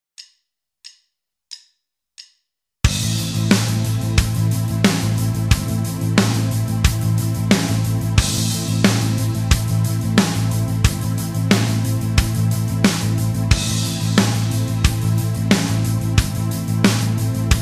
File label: backing; Guitar Techniques 2017 Issue 266 March